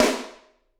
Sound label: SD, Drums; Bob Clearmountain Drums I